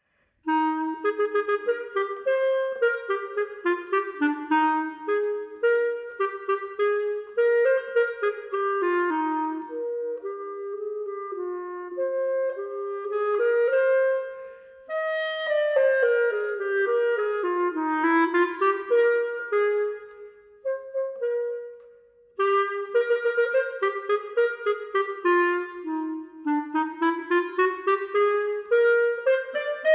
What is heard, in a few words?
Clarinet Etude; 7th Grade